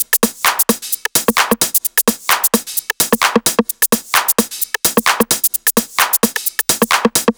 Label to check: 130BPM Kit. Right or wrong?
Right